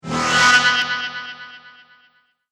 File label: Laser Horror Strike